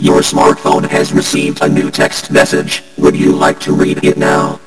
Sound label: Electro Sms